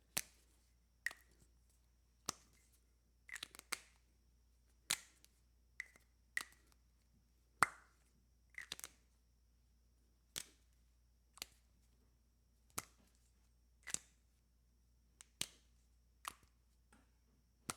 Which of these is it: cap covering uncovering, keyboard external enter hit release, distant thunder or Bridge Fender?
cap covering uncovering